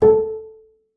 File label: A4, bass pizz; Xsample VOL-5